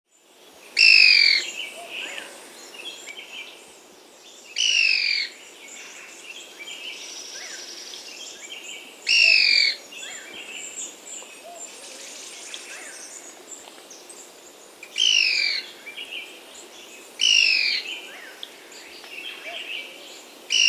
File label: Yungas Tody-Tyrant (Hemitriccus Spodiops), Andean Birds; S. America